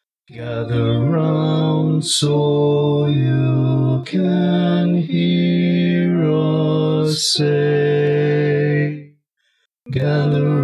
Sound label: Bass, barbershop tag